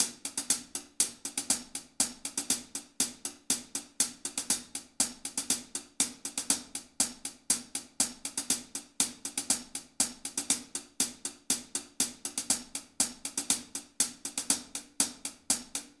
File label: Hihat